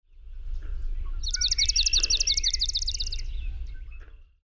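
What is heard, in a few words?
Swamp Sparrow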